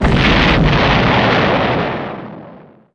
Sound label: exp huge; Tanks